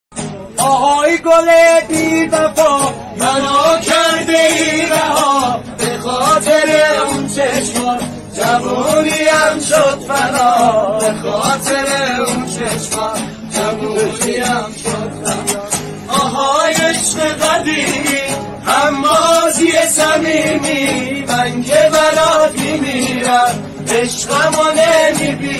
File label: guitar